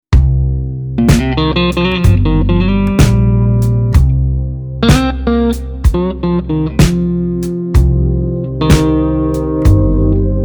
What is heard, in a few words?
63 bpm, Strat, blues